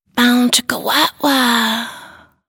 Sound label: Bow Chica Wow